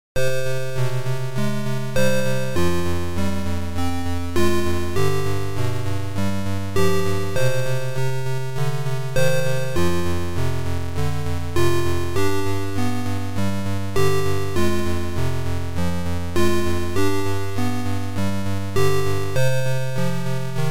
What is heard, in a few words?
Game Over; Infernal House; Lankhor